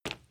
steps single, feet walking

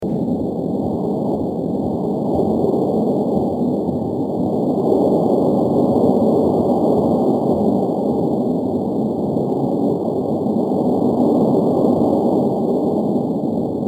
wind; hexgl

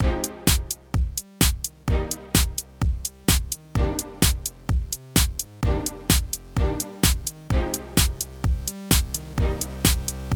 song; vex4

